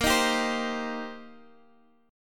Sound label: C Minor 7th, A sharp, chord